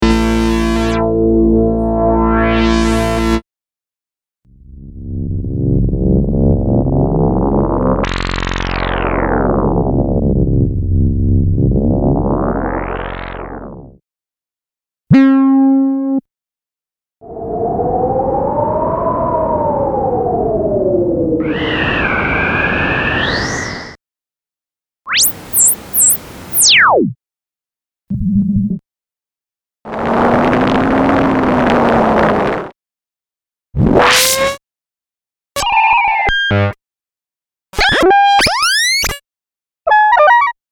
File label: Moog Modular